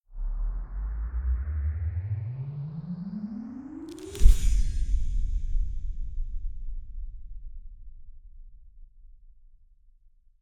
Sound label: Mountain SFX, Flower Grow Burst